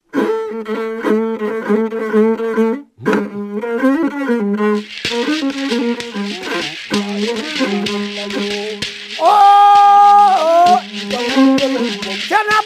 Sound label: during, ghana, frafra